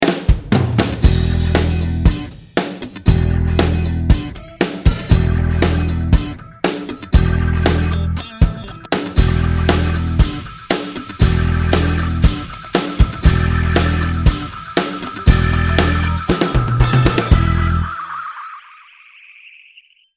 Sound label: Backbeat 118 Big Diff